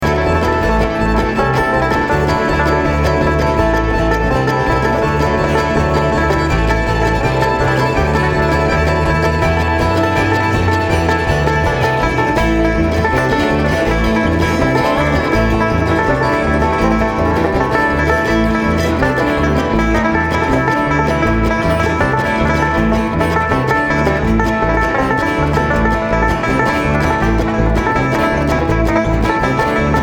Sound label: Traffic Jam